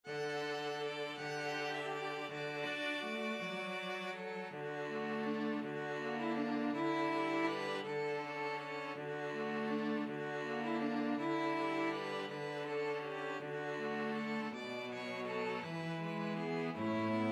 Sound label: string trio